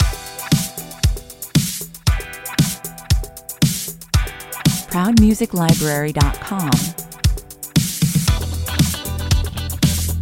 funk (no vocoder)

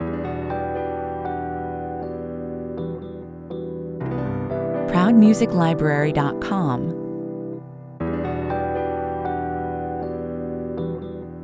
smooth jazz 120 bpm

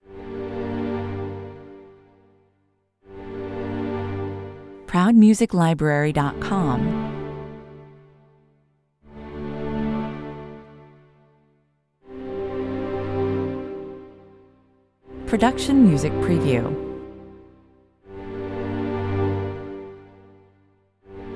sad strings 162 bpm

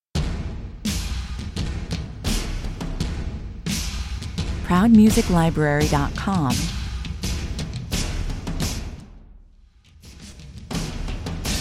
percussion only

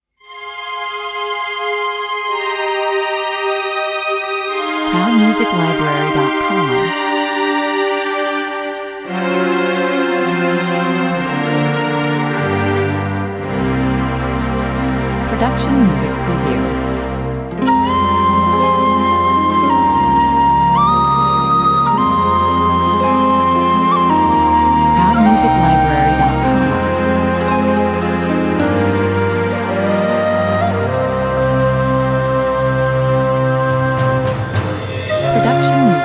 highland ballad, 66 bpm

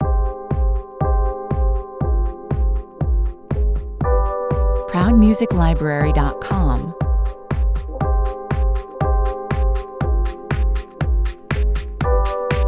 bossa, 120 bpm

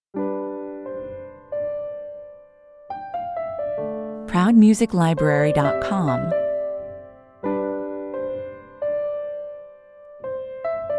piano

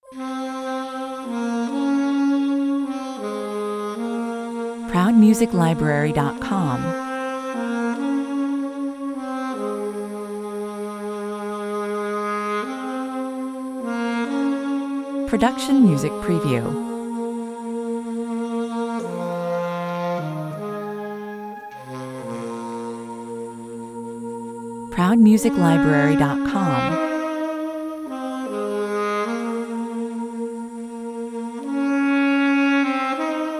distant voice

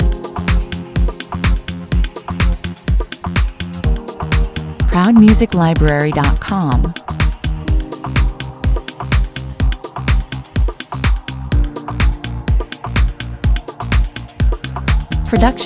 business class (on hold) loops 125bpm

